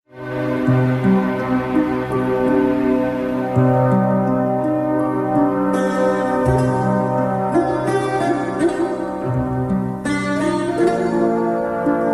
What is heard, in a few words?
Crying Melody